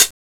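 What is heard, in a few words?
HI HAT CLOSED, CYMBALS; FAIRLIGHT CMI IIX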